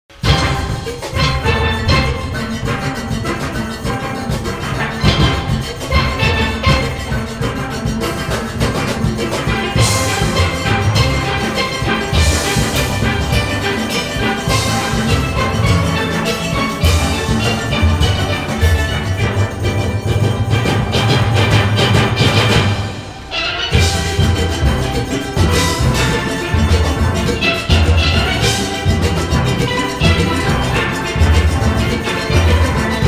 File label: Rain Melody; Tasso-USA